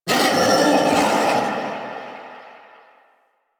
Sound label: Feral Vocal Call; Enemies; Kubrow; Warframe